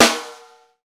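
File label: Snare, Roland; Real Snrs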